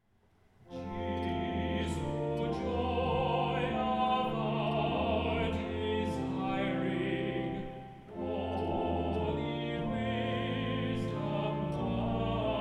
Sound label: Hymn; Jesu Joy of Our Desiring